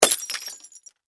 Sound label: Impact Glass Bottle Empty Smash Wine; Materials